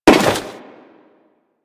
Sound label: Crewman Step Default, Footsteps; Warframe; Corpus